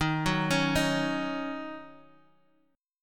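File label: E flat Minor 7th, Guitar, Half Step Down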